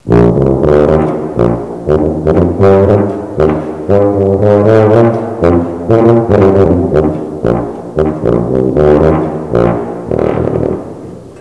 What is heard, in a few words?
tuba